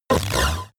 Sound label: sci-fi laser shot short